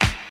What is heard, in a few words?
hand clap c sharp key, Royality free clap tuned to the C# note. Loudest frequency: 2068Hz; percussion; single hits one shots